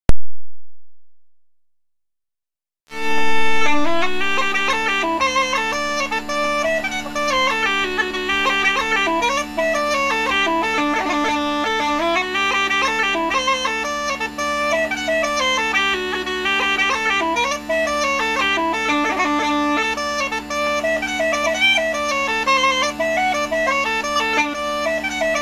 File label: chanter with drones, uilleann; childress; lambe drones